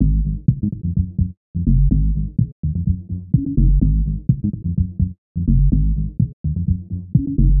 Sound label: 126 G# BASS, Funky Underground bass loops; Loopmasters; Funky Underground Vol 2